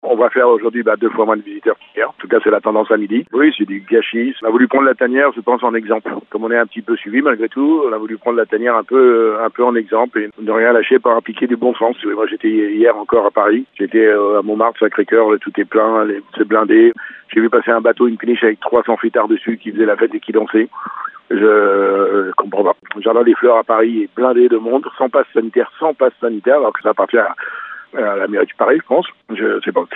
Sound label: violas